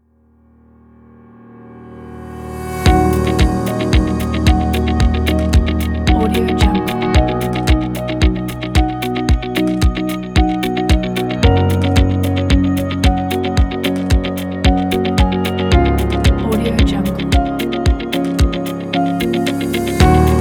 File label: the upbeat inspire corporate background kit